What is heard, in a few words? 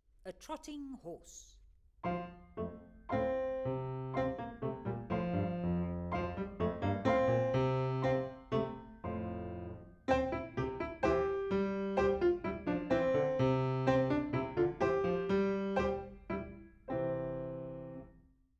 A Trotting Horse